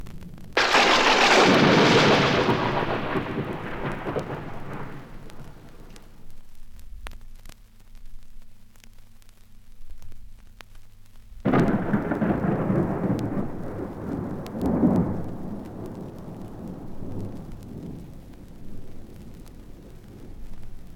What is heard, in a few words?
scary thunderclaps - thunder - horror